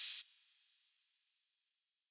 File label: Open Hat, Belgium Beats 132bpm; Big Fish Audio - Old Skool House; OSH Construction Kits